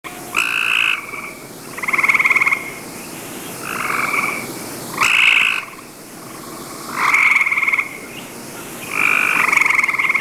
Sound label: treefrog, copes gray; eastern gray treefrog; fish and wildlife